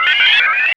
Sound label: sphere blips lg; npc; Portal 2